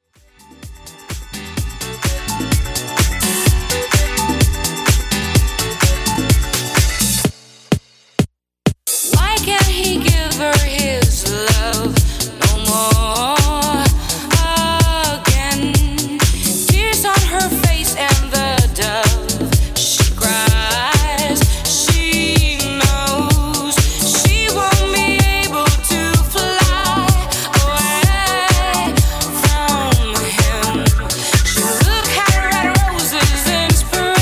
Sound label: Extended Mix, 127Bpm